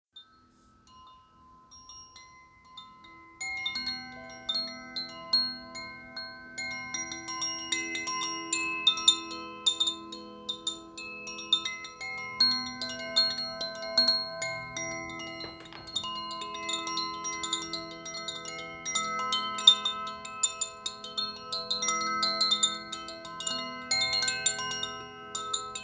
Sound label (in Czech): čakra, čakrová zvonkohra sakrální čakra